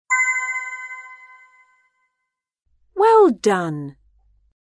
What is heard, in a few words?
Correct